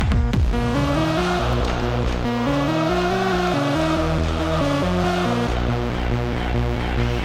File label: goal achieve